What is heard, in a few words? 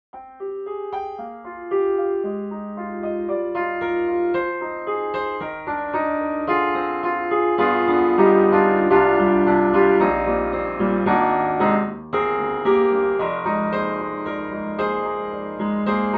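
Vertikal Jazz Rehearsal, piano; VI ONE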